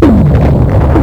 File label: implode